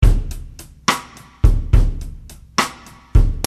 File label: Slow Triplet